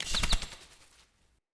UI Default Theme Open

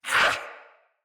Pain Knight Male; Mendicant

